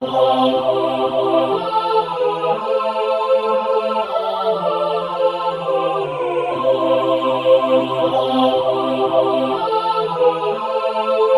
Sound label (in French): il fait danser les mondes tutti